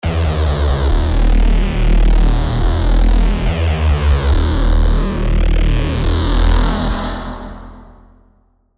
Reese Piece, techno bass; Spire; revealed spire techno bass vol 1

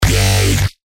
Bass Shots G; Garuda; revealed bass shots vol 3

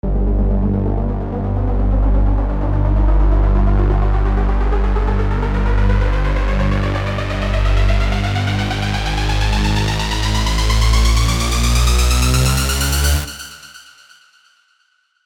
Tonal Riser G 8bar 128 BPM; Buildups; revealed buildups vol 3

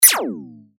FX Hat, cymbals; revealed cymbals vol 1

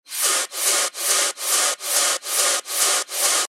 techno loop 140 BPM; Machtig; revealed techno top loops vol 8

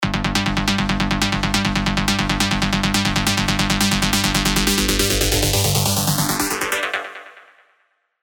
Madbass, rolling bass; revealed serum 2 rolling bass vol 2

